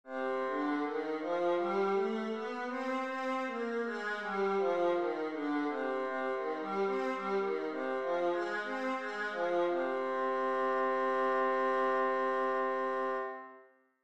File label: viola c minor, scales